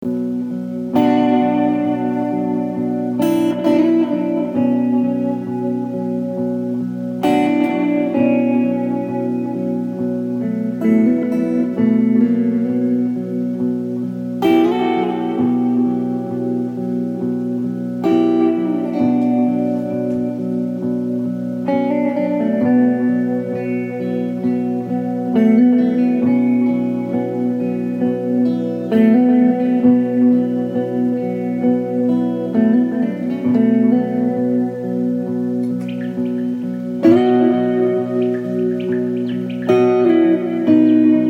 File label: drone loop